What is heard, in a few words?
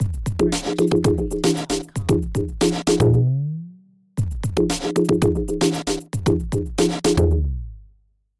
rhodes beat logo; soundscrate